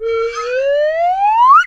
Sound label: whistle with sliding tone, Cartoon Film Effects